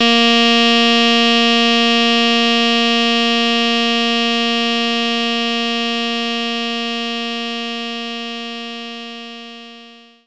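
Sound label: Alto Sax Bb3; knights try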